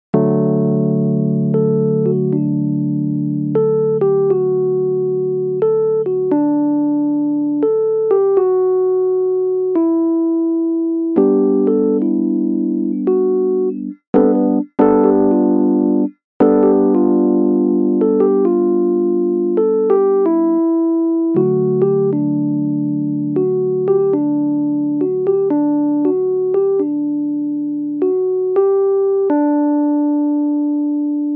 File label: noddling